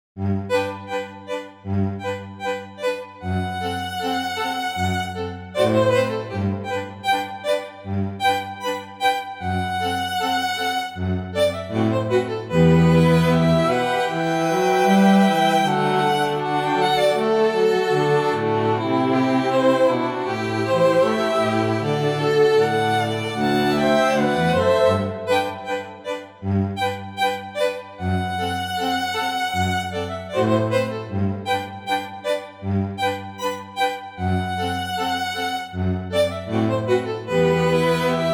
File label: String Quartet